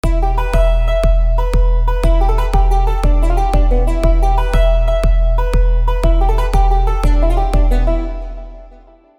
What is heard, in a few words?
dropppp